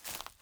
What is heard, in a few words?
foliage step